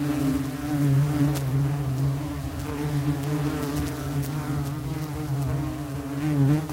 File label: Flies; Game rip; MiSide